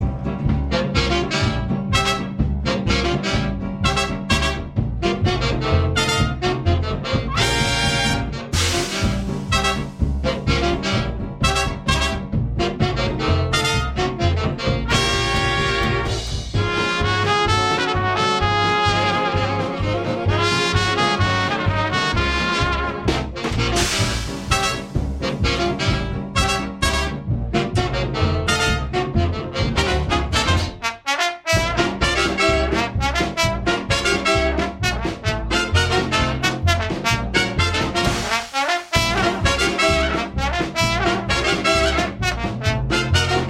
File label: Jungle Jazz